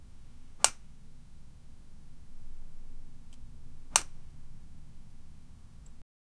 spotlight turning on, lighting

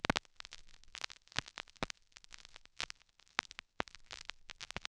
vinyl scratch sound; noise

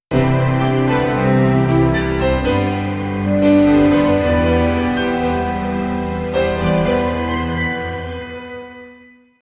soft orchestral backgroun

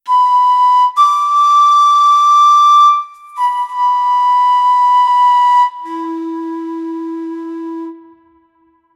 pop flute motif

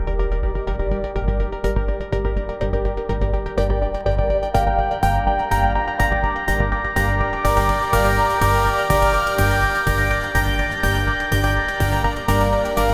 high energy progressive house

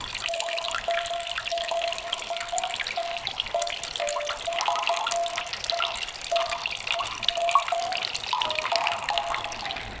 soft and calming sound of, garden

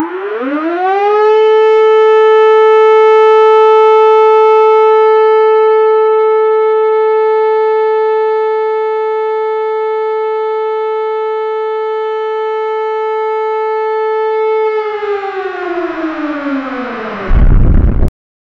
a loud and repeated siren, alert

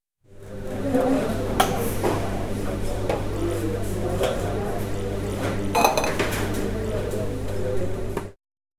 the interior of a viennes; beverage

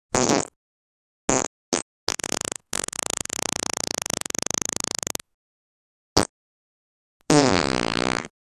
quick succession of small fart noises; sound effect; body sounds

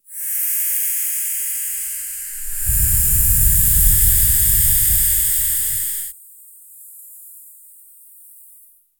air magic used by an, air

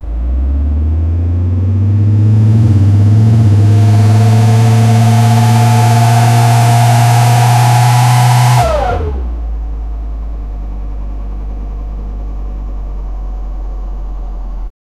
the sound of the accelera, automotive

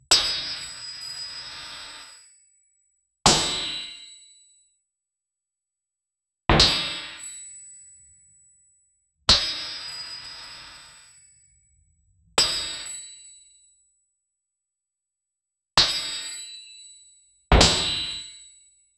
bullets ricocheting off a, metallic ping

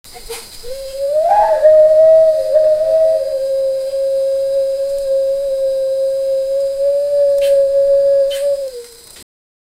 asian domestic water buff; domestic animals

